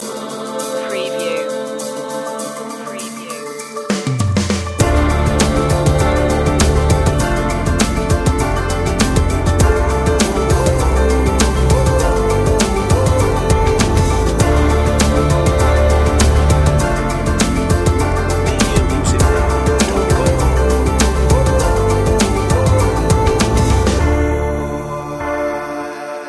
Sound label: tribal trip hop